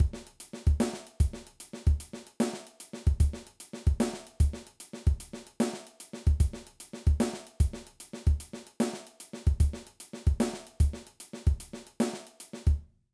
Shuffle Groove, drum

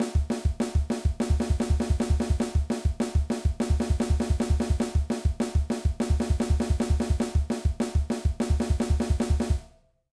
Hand and Foot Exercise, drum

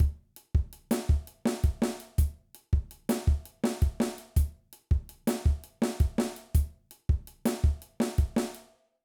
Groove 68 Drum Beats

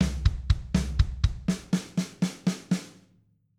Triplet Fill, drum

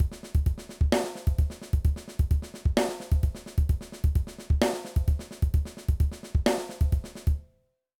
Impressive Beat

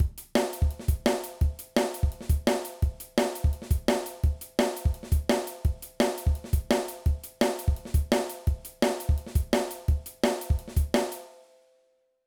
Groove Drum and Bass Drum Patterns

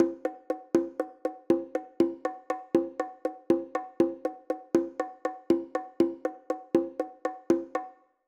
Bongo Pattern

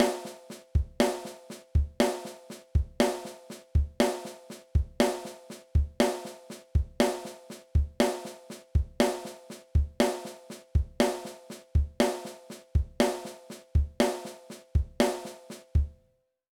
Chop

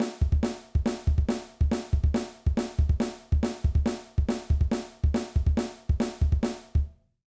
Punk Beat

